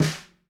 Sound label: Snare